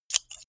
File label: scissors open close